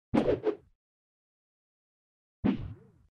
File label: hoverboard active; Subway Surfers